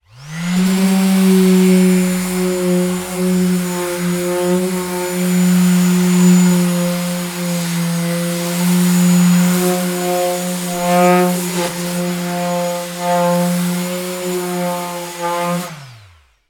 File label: industrial orbital sander electric sanding wooden door; zapsplat